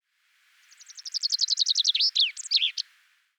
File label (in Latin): fringilla coelebs imit pic; hockai